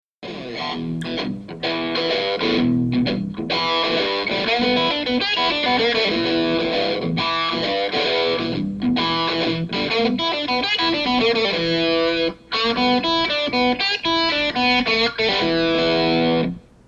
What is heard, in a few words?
triple blues lick, guitar